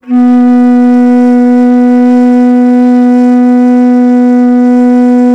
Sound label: BASS BONS, India Winds; E-MU Producer Series Vol. 7 – Old World Instruments